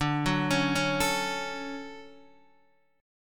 D Major 7th, D, down, Guitar DADGAD

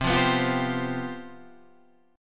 C Major 7th Suspended 4th, Standard; strummed; CM7sus4; 8,8,9,10,8,8

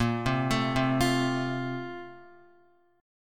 Bb Major 7th Suspended 2nd, Guitar

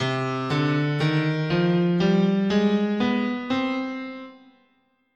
C Harmonic Minor, scale

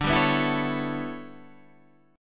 E Minor 13th D

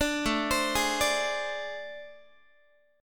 A Minor Major 7th Double Flat 5th A, Guitar; AmM7bb5; arpeggiated; chordshape; 17,17,15,18,18,x

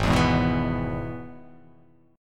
G sharp Minor Sharp 5th; 4,7,6,4,4,6,x,x

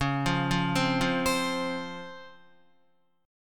Csharp Major 7th Csharp Guitar; C#M7 arpeggiated; 8,8,5,5,5,8; JGuitar

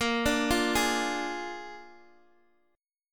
Bb 7th Bb Guitar Open D, chord

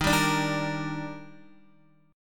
Eb Major 7th, Guitar; strummed; chord

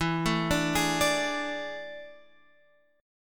A Major 7th Suspended 4th E

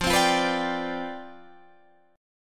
C Major 7th Suspended 2nd Suspended 4th F, chord

